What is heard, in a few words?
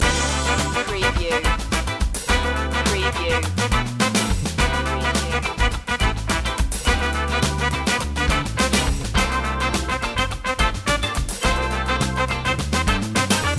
funked up loop